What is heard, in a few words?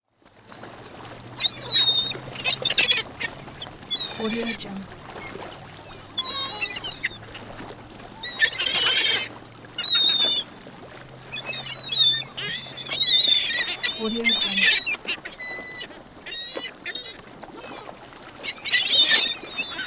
seagulls; مرغ های دریایی در اسکل; nature sounds